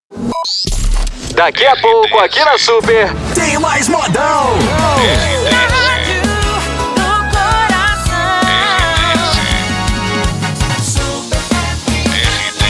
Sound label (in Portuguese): ENTRADA BLOCO SUPER SERTANEJO